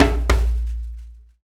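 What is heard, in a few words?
percussion hand, percussion loop; Roland LCDP14 Africa VOL-2; BTS Af.Prc.Loops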